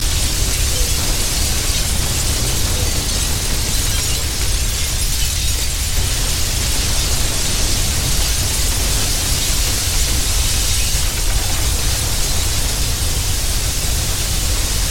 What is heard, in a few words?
Earthquake Crash; Sound Effects Bible